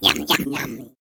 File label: canibal eating